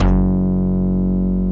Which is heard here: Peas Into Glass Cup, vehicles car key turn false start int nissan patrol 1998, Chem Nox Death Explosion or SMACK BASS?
SMACK BASS